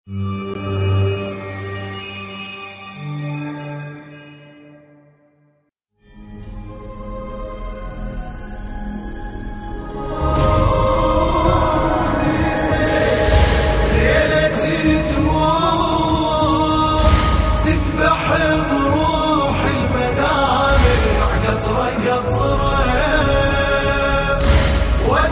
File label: nawha arabic